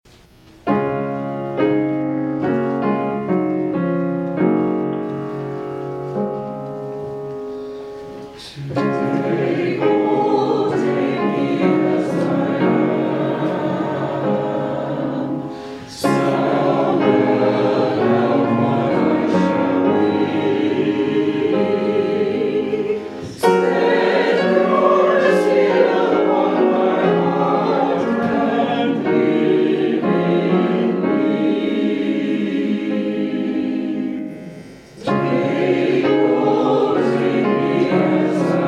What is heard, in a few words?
Hymn; Take, O Take Me As I Am